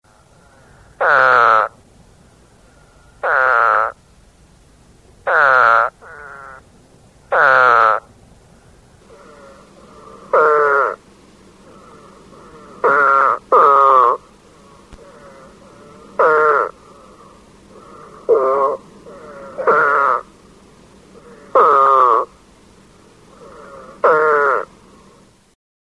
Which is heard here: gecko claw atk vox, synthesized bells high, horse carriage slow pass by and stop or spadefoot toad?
spadefoot toad